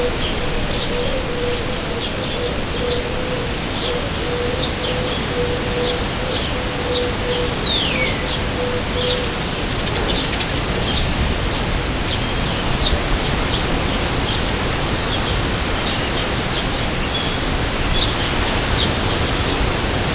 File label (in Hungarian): belso vegallomason varakozva kisvasut, szel szabadban; halastavi kisvasut